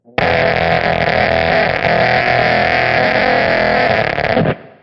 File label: dying amp, Guitar Noizes